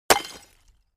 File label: Clay Chimney Wall Hit, concrete & brick